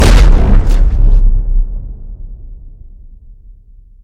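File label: explosion Sound; BattleBuddies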